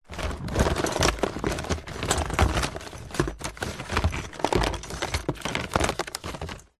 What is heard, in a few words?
container plastic rummage odds and ends